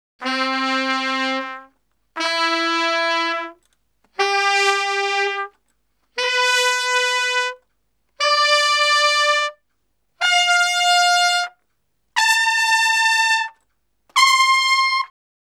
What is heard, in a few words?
Salsa Picante; Masterbits; World Zone VOL-3